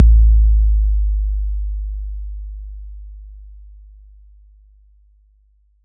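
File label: bass drum 808 Decay F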